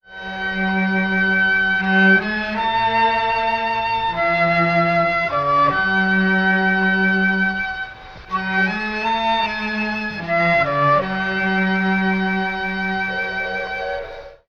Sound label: flute, Loops